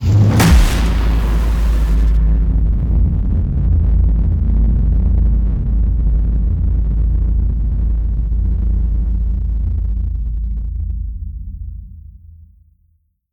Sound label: HIT SciFi, Cinematic Hits Designed